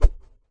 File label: kung fu staff, swings; UNUSUAL KITS and FX